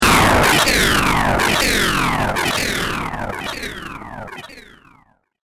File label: Aggressive Impact Explosion; Bomb Sound Effects